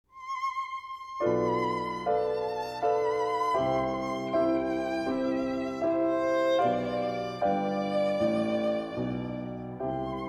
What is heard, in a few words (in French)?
Choral pour violon et piano; Guillaume Lekeu